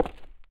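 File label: gritty cement run, footsteps; chief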